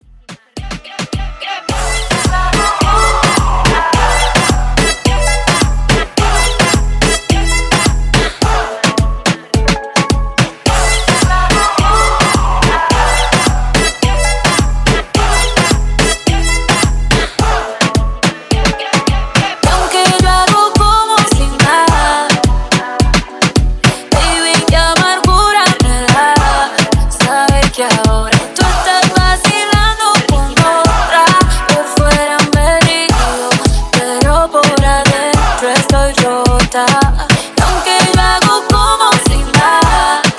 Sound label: Latin Moombah Party Ext Hype In Outro 107 BPM